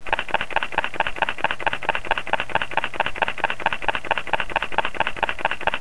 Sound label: Olympus E-3; jpeg